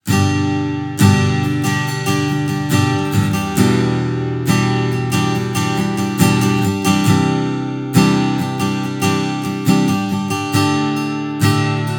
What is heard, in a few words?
STRUM